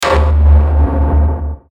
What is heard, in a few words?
Hit Deep Bass; Deep Tones; Blastwave